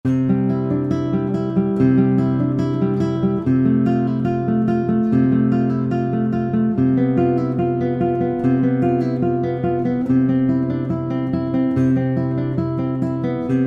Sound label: Prelude BWV 1007, guitar